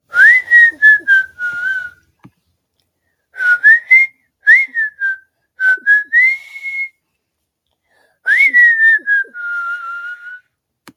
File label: goofy ahh whistle